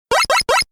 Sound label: shot